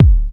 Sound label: studio kick single shot e key; kick drum samples